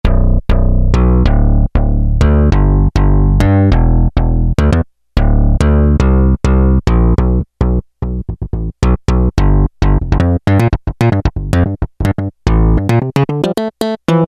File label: Syn Bass, Roland W-30; Factory Performance Patches